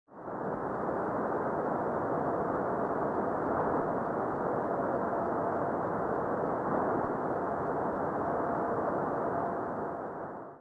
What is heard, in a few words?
Bolide; Sandia